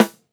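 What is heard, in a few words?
snare, Roland MV8800 drum kit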